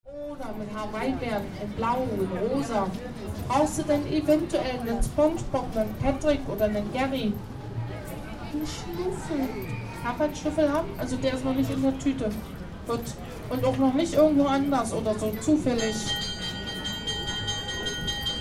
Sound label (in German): weihnachtmarkt alexa caesar palace